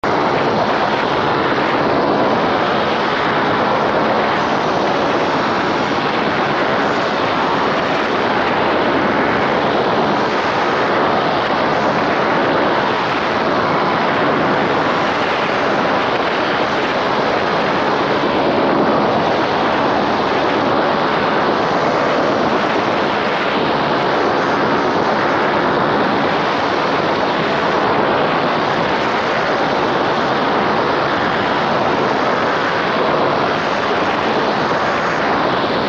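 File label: Static Distant Grinding, static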